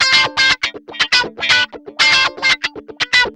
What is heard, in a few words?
GUI, 120 BPM